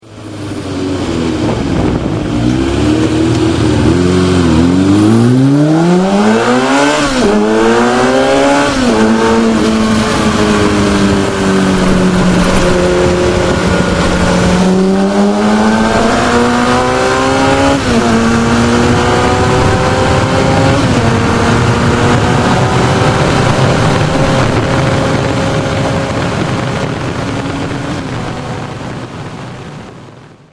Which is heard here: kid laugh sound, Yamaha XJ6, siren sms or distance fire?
Yamaha XJ6